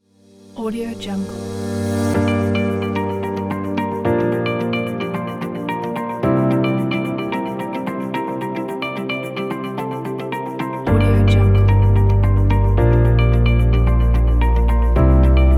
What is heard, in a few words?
minimal documentary kit